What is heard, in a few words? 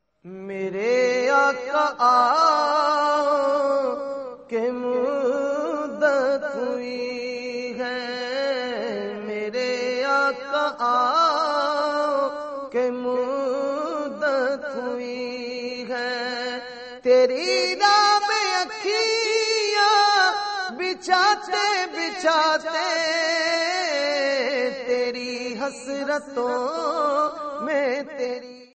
naat sherif ringtone